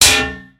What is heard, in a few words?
tank hit; sfx tanks